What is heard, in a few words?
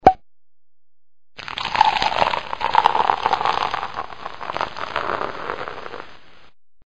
Champagne Pop Pour